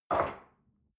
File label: espresso grab from table medium, restaurant cafe